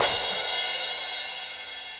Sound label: Crash 16 Dark Plate Verb, Cymbal; Drums; Drum Hits; Standard Hits; Ableton; Factory Packs; Session Drums Club